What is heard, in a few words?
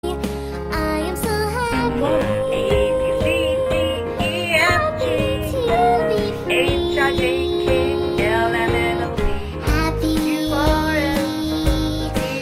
cringe kids song cringe warning